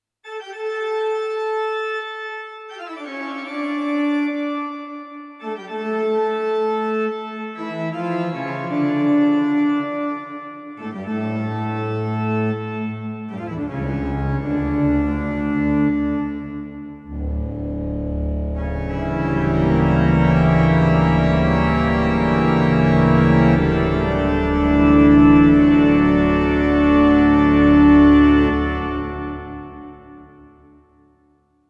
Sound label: Sony DRE 2000 Organ Sony MU R201 Plate